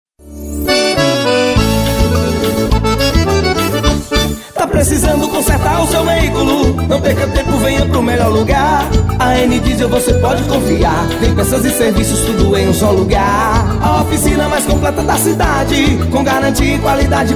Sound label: JINGLE; A. N. DIESEL; Peças e Oficina